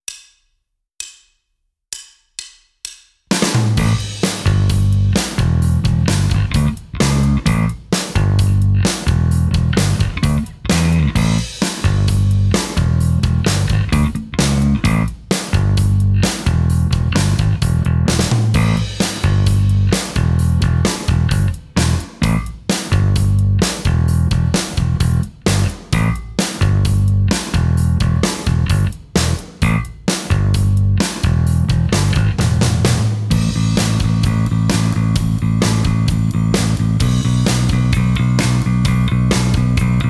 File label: Rhythm Guitar; Guitar Techniques 2018 Issue 289 December